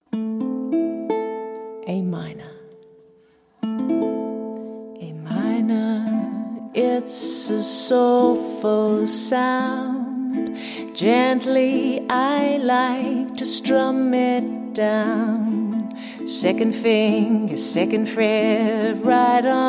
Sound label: A Minor Song